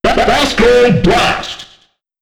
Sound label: Blast Sound; Basco